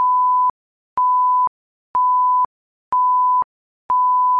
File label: wx alert